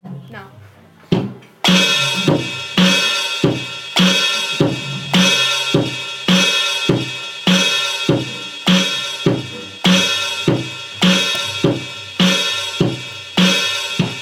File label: drums